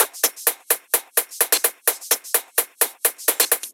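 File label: Drum Loop 128BPM hi-hat; VEC5 Drumloops; VEC5 Multi Loops; Loop Elements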